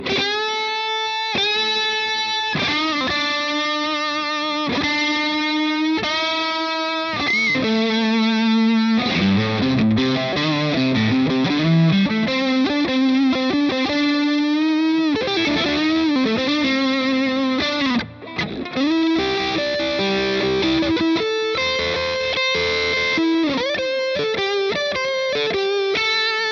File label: Fender strat 65 Solo